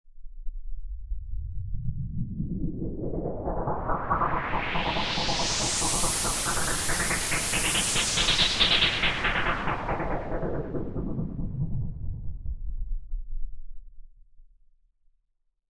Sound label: Pink Noise Effix Delayed; Techno